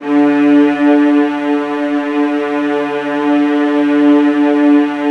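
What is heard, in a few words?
VIOLAS E3; ILIO; Synclavier Strings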